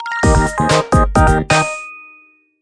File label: Collected Item; Chibi-Robo! Zip Lash